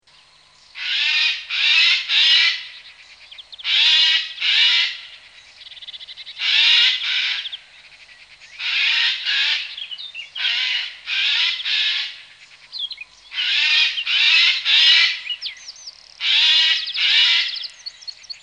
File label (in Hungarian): Szajkу; Madarak